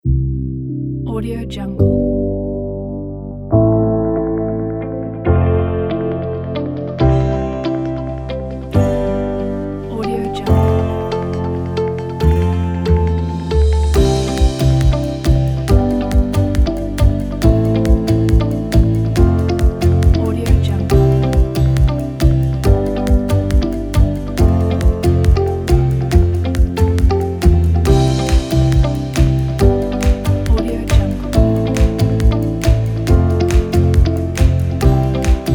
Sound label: optimistic positive corporate